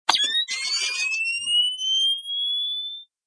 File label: canon reloading; Predator Mod